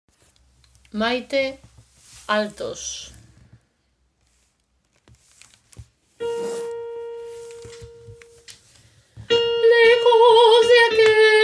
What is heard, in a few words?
Alto